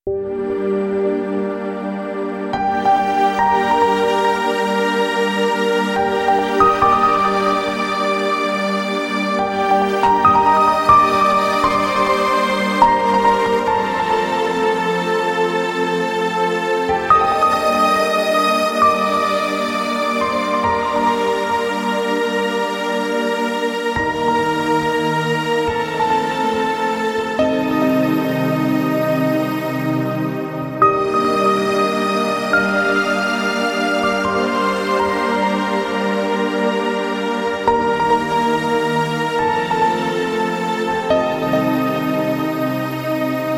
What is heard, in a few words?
royal piano